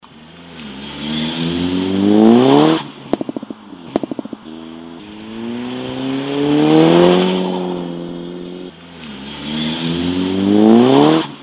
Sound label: saab passing by